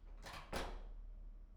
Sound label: door slam; IEEE AASP